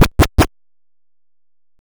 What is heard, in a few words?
run; SpiderCut